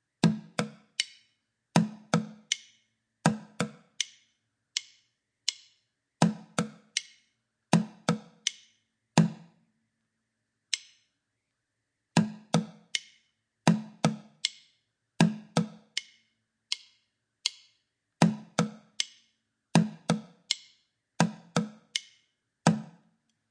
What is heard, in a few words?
Drumming